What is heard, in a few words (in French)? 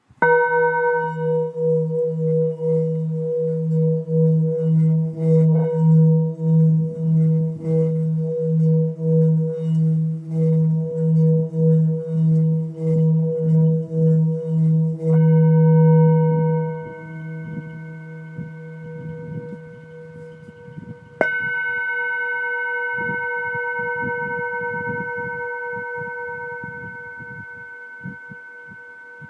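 Full moon mi, bols chantants tibétains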